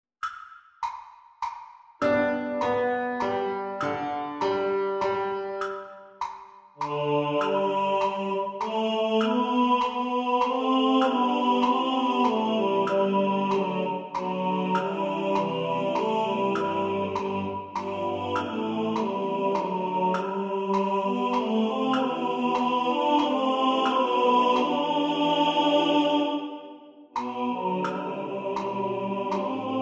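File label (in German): Tenor2 im Vordergrund mit Metronom, Männerchor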